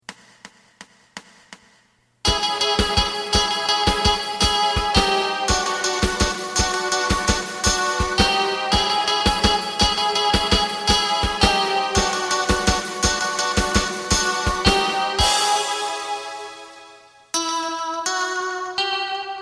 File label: bass